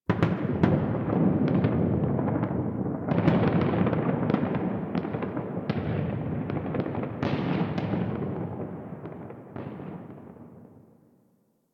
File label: Ambient Distant Explosions; Nineteen Ninety Nine